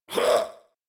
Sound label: Death, en; Warframe; New War; Teshin